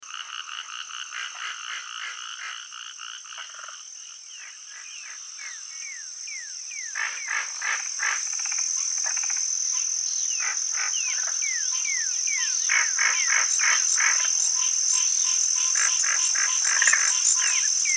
Frogs

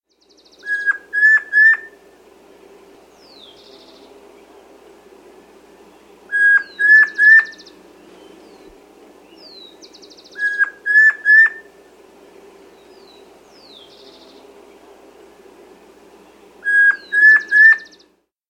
Rufous-Faced Antpitta, S. America

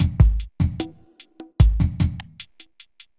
evil beats 75

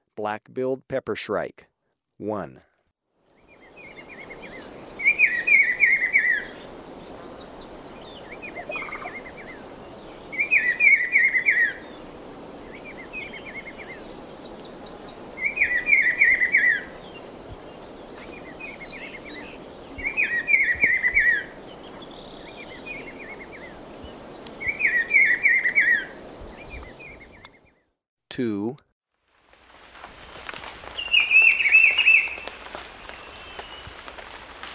Black-bellied Peppershrike, Birds of Ecuador; S. America

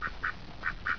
QUACK; 鸟; animal

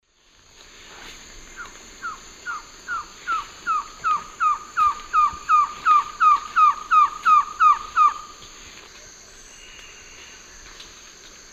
Trogon viridis, song, Eastern Lowlands; S. America

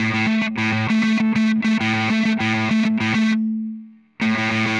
Pushing Riff, Rock Guitars

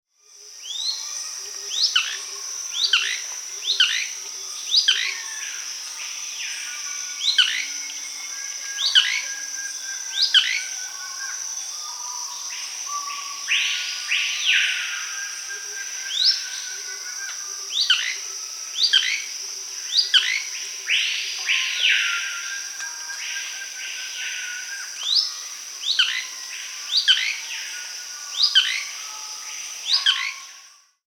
Blue-crowned Manakin (Pipra coronata), Peru; S. America

